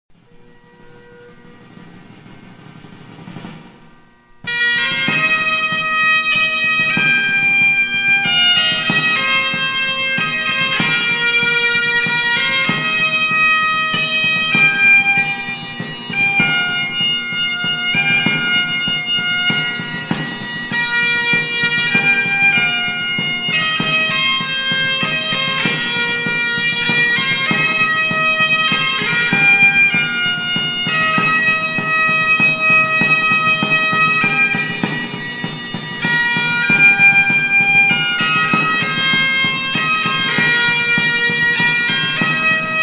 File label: Bagpipes; Men of Erin